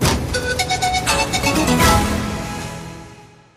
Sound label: largeWin; eldorado